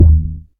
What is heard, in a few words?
subtle reverb bass drum g sharp key, Royality free bass drum sample tuned to the G# note